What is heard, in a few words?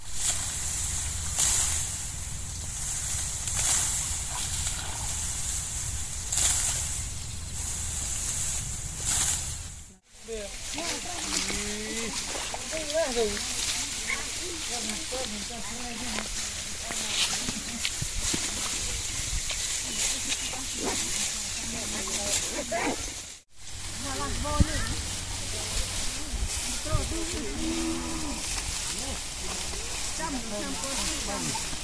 rice harvesting; sounds of angkor